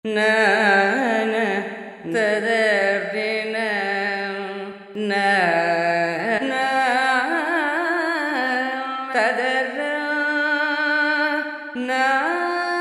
SINGING - Indian Male - Alaap Phrases; Spectrasonics Heart Of Asia

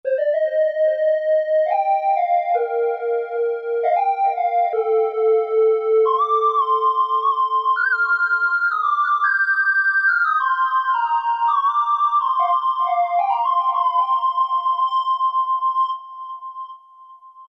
Lead, Roland JP-8000; WIchita Lead; Factory Patches

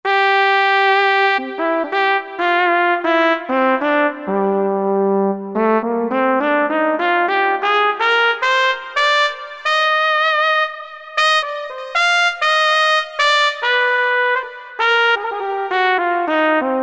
Legato Tpt; Roland SR-JV80-09 Session

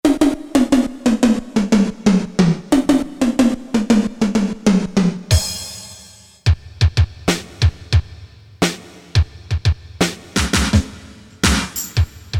MIDI MIX; Korg DSS-1; Combination Snds

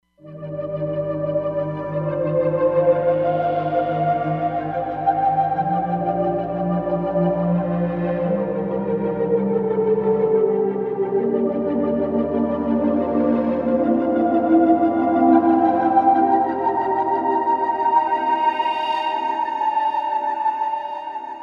Guild Vox, Roland Fantom XR

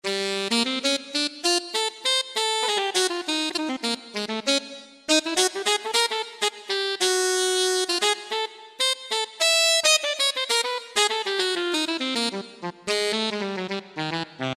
Tenor Break, Roland JD-990; Factory Patches

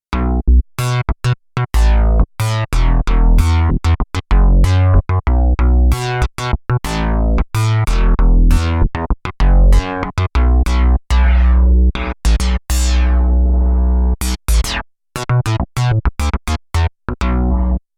VPM Dyno Bass, Korg Radias-R

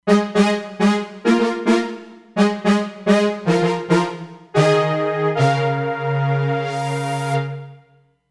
Saw Brass

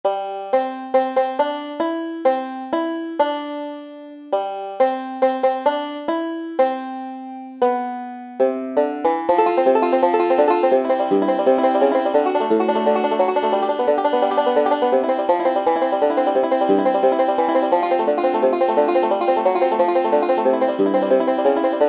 BANJO; Sonic Reality American Heartland

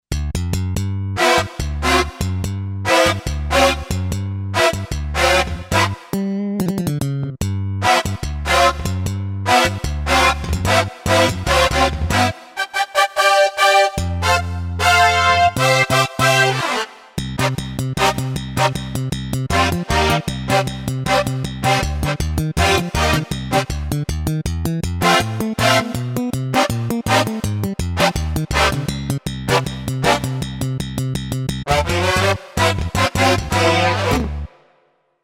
Bass-Brass Sect., Roland SC-880; Factory Patches

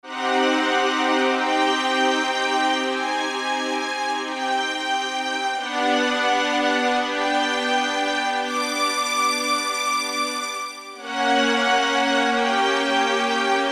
ens - Slo Strng, E-mu Proteus FX; Factory Presets

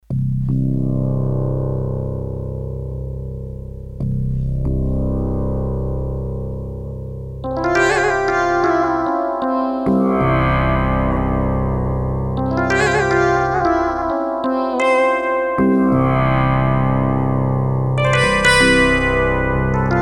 India, Korg DS-8, Factory Patches

